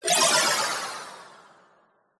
negative game play sound fx arcade casino kids mobile app